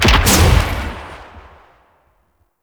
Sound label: Vassal Laser Shot; Enemy; Characters; Abilities; Mendicant; Mnd King